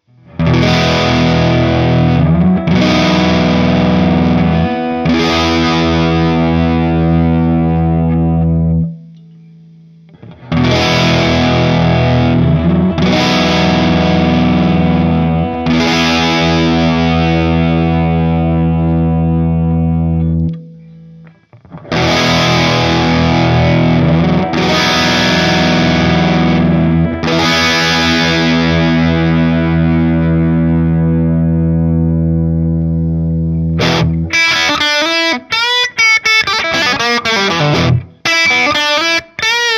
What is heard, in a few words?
retromatic dirty; Peerless Retromatic